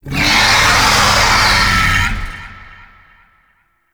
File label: Pirate Kraken Call; Warframe